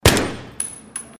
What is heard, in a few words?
ump single indoors